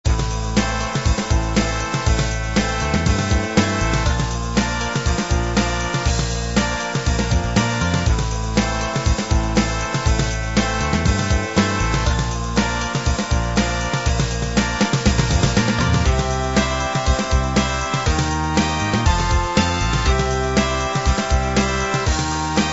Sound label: uk pop